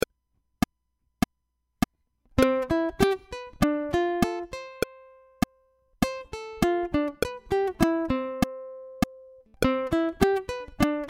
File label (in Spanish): Escala Back Pause Click